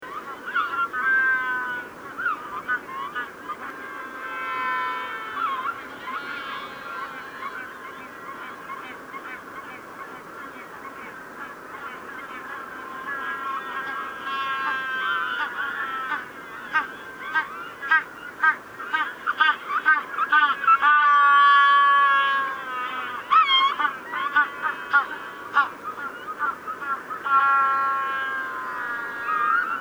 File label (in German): Magellan Pinguin Abendkonzert